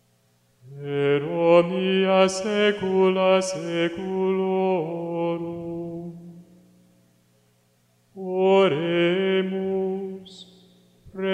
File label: Pater Noster Solemn Tone